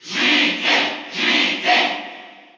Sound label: Cheer Russian; Villager Male; SSBU